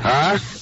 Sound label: huh male, Voice